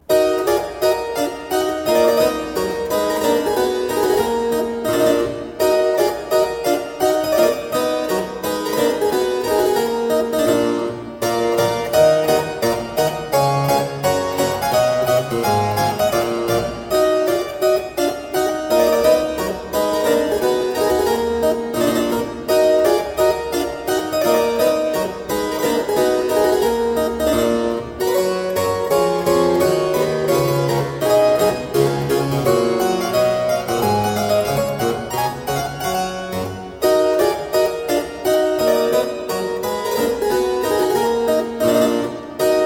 Suite in E Minor - Gavotte, spoken, Keyboard Music; William Croft